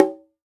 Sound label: conga batter; Best Service ProSamples vol.55 - Retro Sampler; AKAI